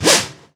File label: FX Snare